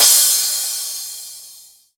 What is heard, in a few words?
Crash, Cymbals